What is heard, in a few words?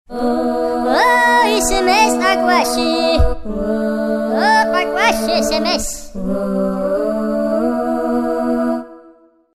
Oriental Sms